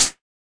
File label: hat; Hats